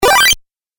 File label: 8 Bit Style Bright Collect; Video Game Sound Effects